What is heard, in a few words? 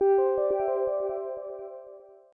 alarm; windows10